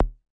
kick, drums single hits; iElectribe Kicks

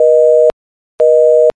busy, Phone Tones

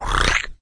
tongue ripple hit; Weird and Interesting Hits; single hits

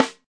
plain snare; single hits

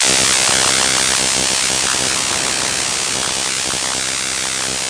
electric and annoying

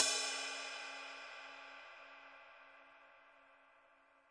big ride bell; single hits; Big Believable Rides

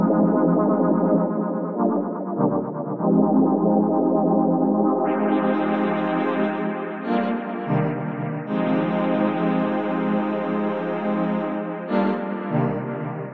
nice warm stringy pad melody; SYNTH AND ELECTRONIC

